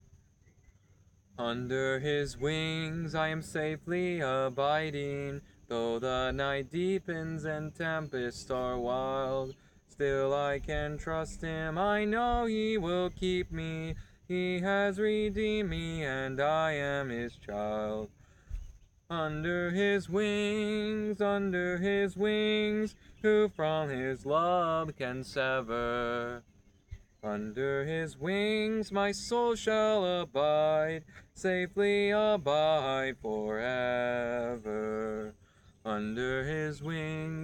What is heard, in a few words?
Singing; Under His Wings